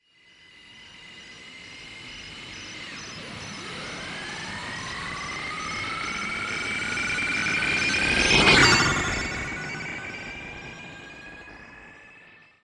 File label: MORSECODE; AKAI Anime Sound FX; FLYOBJECTS